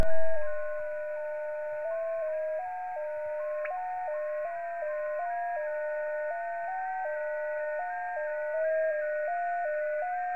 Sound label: jt65 hf sound